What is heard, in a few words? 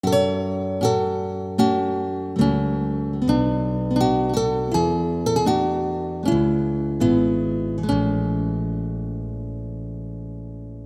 piano song; 2012 - Barkley 2